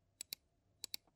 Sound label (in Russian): klik myshi zvuk